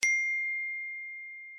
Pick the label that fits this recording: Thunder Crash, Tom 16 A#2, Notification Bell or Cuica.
Notification Bell